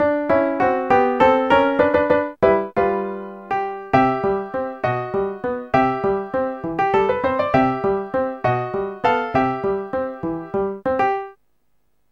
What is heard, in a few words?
Underwater Theme